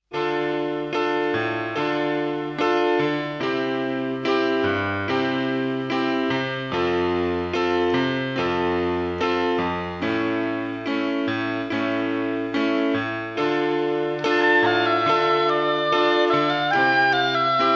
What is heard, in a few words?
ocean of synth